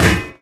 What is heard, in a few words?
physics turret impact lg